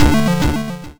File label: ChipShop YM Arp Dm; CHIPSHOP HITS; ARPS; CHIPSHOP DELUXE